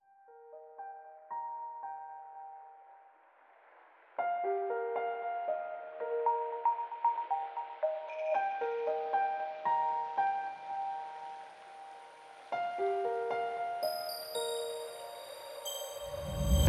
Lofi Music Intro Stinger; Kawaii Love Lofi Music Pack 2

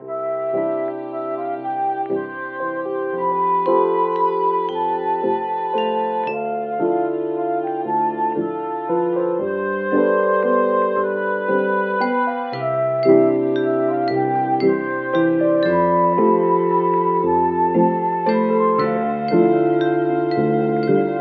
Intro Stinger, Kawaii Love Lofi Music; Kawaii Love Lofi Music Pack 2

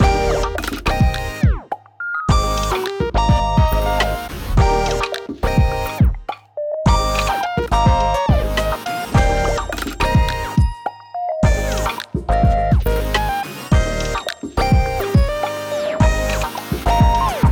Lofi Music Loop; Kawaii Love Lofi Music Pack 2

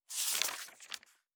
page

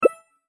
Choose, User Interface Menu; Advanced UI

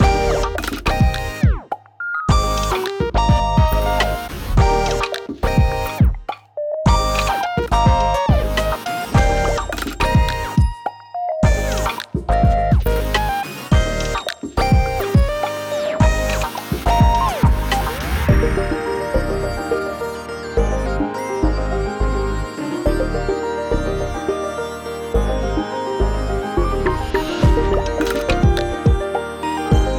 Loop, Kawaii Love Lofi Music; Kawaii Love Lofi Music Pack 2

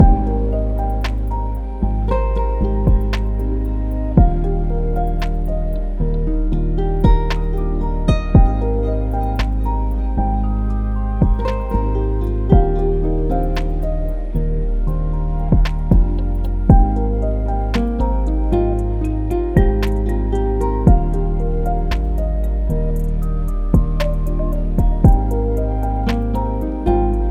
Lofi Music, Seeking Loop; Kawaii Love Lofi Music Pack 2

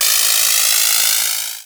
Machine, Retro Games Sound FX

